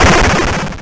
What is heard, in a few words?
break; opensurge